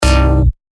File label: bass shot C; revealed bass shots vol 3; Icon